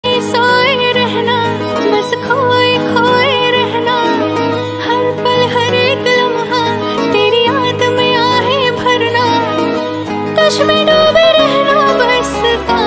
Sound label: female version